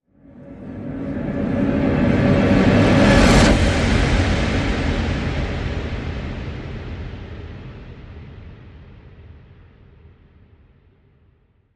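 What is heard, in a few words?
Reverse Drum Roll and Cap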